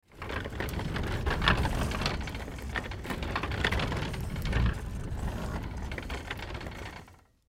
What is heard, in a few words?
Cart Roll Creak, Cart Roll Creak Wheels Long; Rolling; Foley